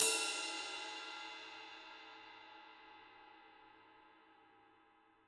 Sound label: Ride Stick Mid Medium Dry Session; Drums